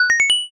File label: asia xylo